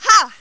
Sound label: Hah, voices; Riviera